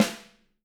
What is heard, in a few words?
6X12 ALUMNUM, Living Drums; Sampleheads; Peter Erskine